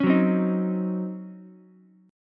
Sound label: Db Major 7th Suspended 2nd Suspended 4th, Db, Ukulele; 6,6,8,6; DbM7sus2sus4 strummed; JGuitar